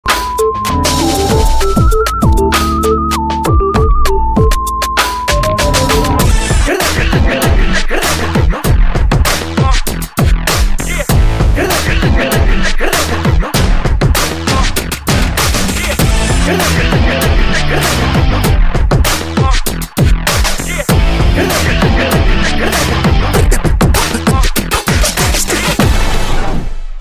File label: enthiran chitti dance showcase ringtone bgm; rajinikanth